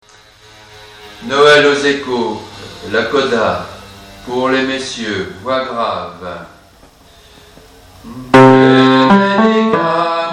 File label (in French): noël aux échos coda hommes voix graves